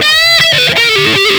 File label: guitar riff; birthday party bash; wii; Spanish; simon says